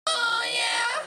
Hooyeah

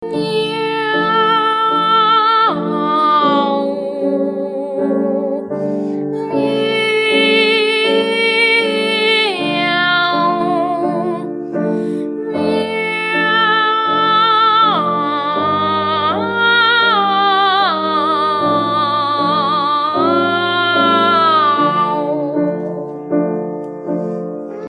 Meow song

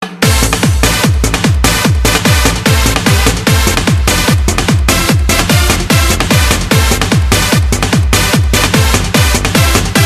Groove

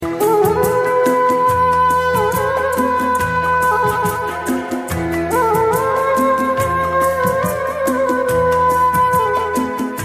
Sweet Bansuri

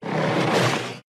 Carnotaurus bite

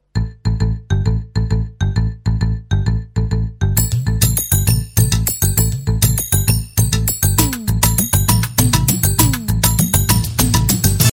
Mix Beats

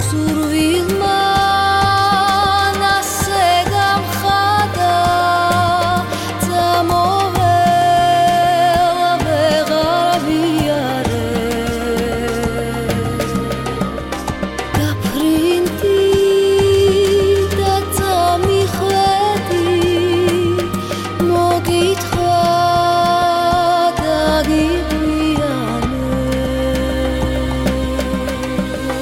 Georgian Folk

